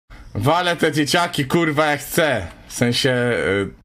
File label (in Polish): dzieciaki